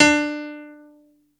PNO D3; Zero G - Man Machine

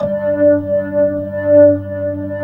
G2, Keyboards Old School; USB Soundscan vol.09; AKAI; BIG HAMM

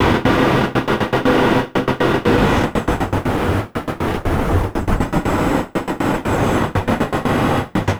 Envelope Beat; Drums & Drones

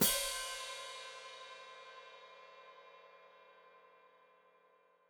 Hat Closed Open, Hi-Hat

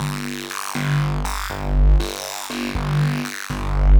Sub Strung Bass; Sonic Foundry (Sony Creative Software); The Electronic Music Manuscript Collection; Bass Foundations

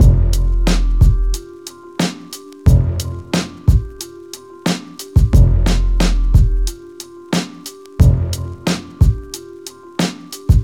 jazz, breaks

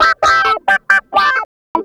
Clavinet; Ambient Grooves

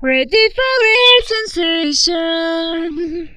AUTOTUNED, Funky Vocals; USB Soundscan vol.18; AKAI

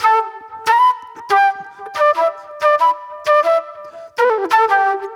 Sharp Delay Flute

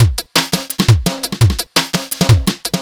Funky Beat, Drum N Bass

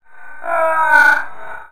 AH HAH; ILIO; Trance Fusion

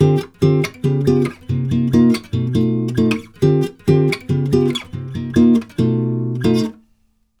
CHORDS, Brazil Bossa; USB Soundscan vol.16

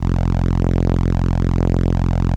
ELKA, String Machines; ELKA RHAP

